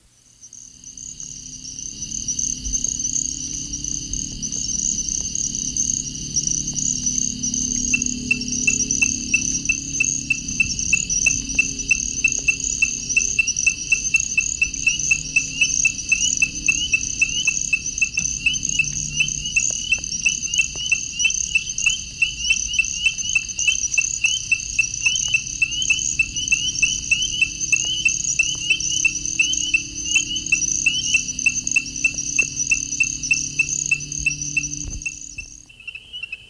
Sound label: group chorus winter